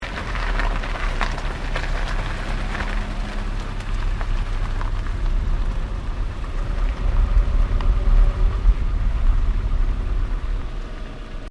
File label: Car Toyota Starlet turn slowly; Toyota hire car in Japan; Cars; Vehicles; SoundEffects-Foundation1